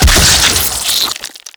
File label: Infested Eris Cyst Explosion Single; Destructable Cysts